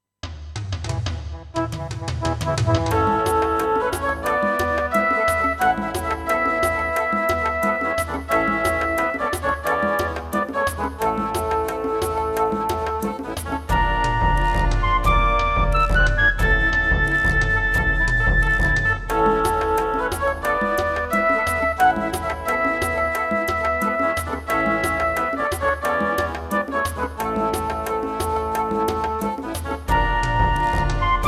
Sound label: SC880; QFG3